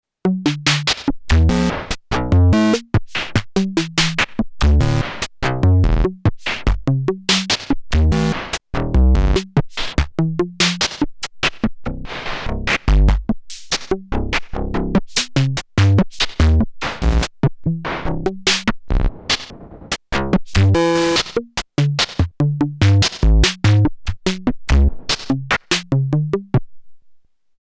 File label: Erica Synths Perkons HD 01 Voice